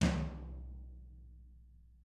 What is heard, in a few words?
HIT, Drums